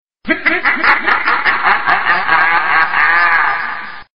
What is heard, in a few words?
ghostly laugh; ringtones